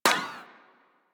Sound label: Bullet Impact Ricochet; Warframe; Weapons